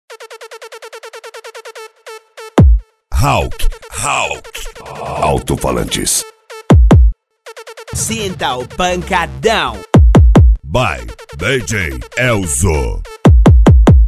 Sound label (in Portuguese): frequencias; hawk altofalantes 2015